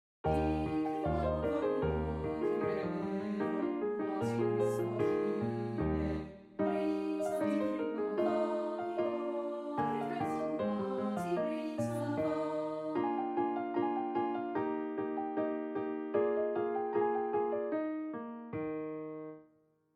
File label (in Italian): Lied per Canto e Pianoforte Allegretto; Schmittbaur; Abendlied eines Hirten